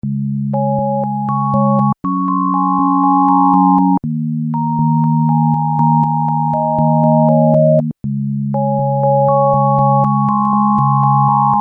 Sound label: piano celesta dual, yamaha dx21; PIANO: celesta chorus mode